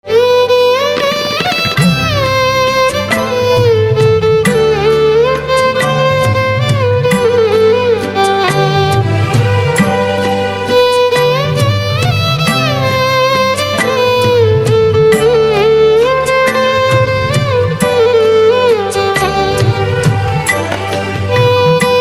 Violin

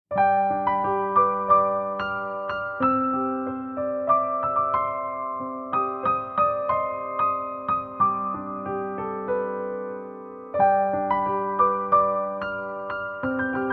Silent Piano

